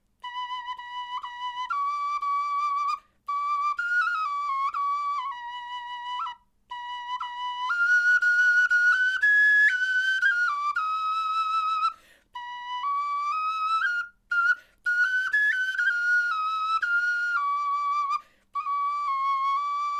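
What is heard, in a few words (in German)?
melodie, Knochenflöten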